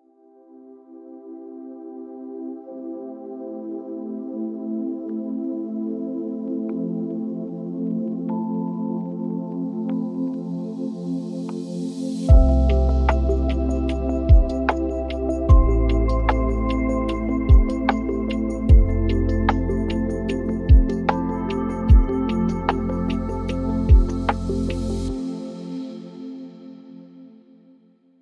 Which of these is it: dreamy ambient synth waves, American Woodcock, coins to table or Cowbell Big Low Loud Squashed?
dreamy ambient synth waves